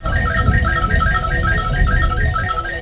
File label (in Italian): suonerie ambiente